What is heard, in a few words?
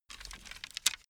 bamboo pick up